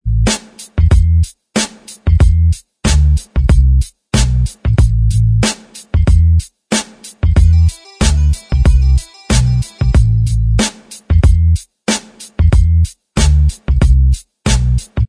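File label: instrumental track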